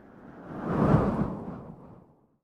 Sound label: Strong Wind; anemoi